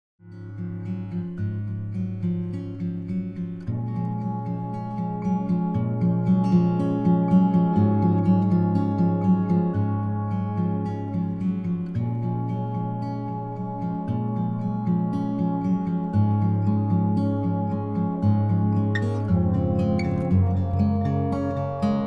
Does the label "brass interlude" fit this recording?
Yes